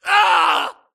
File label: grunt death crush; Titanfall 2